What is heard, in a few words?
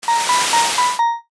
foley spoon ceramic stir